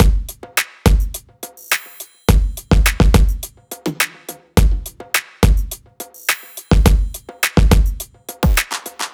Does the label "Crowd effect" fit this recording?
No